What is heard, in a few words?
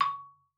marimba C#6; Xsample VOL-4